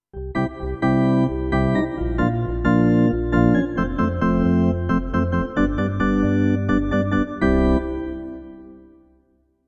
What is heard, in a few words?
Drawbar Org